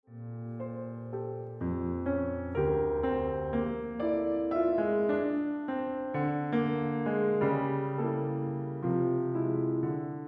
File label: sonatina